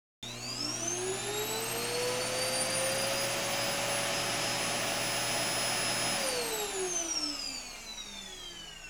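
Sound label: soujiki, vacuum cleaner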